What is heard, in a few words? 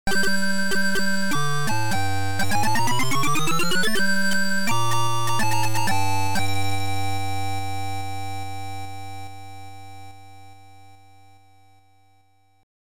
Turn the Tape Over, Beeper; Gemini Wing